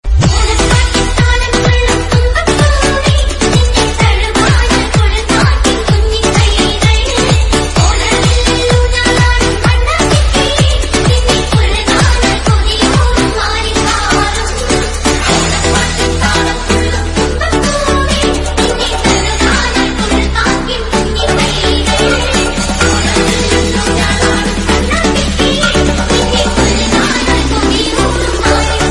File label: Malayalam Song Ringtone